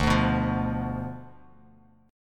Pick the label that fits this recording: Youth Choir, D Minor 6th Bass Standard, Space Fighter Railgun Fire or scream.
D Minor 6th Bass Standard